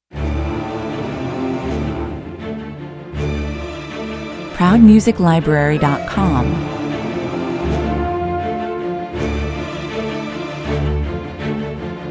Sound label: with choir no percussion